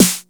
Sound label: snare, Disco House; USB Soundscan vol.20; Fresh Disco House I; AKAI